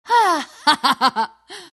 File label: lina laugh; dota